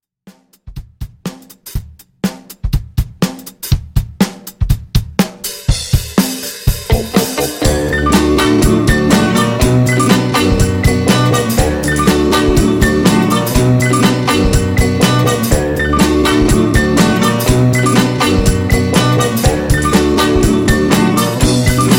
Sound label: 60s Classic, 122 Bpm; ReDrum